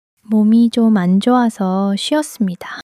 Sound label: struggle